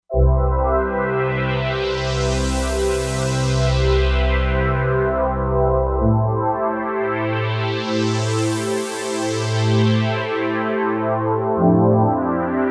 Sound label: 4 Osc Sweep